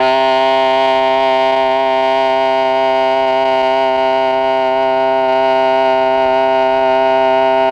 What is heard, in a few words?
MEL OBOE